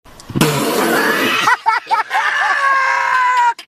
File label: funny meme